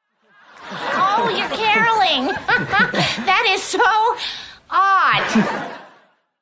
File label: odd caroling